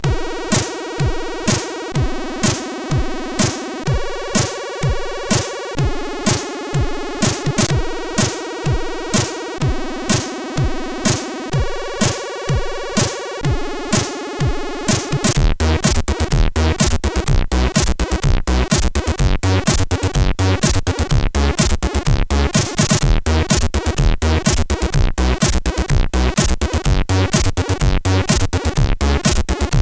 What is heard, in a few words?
music, sid, CSG8580R5; No Limit 06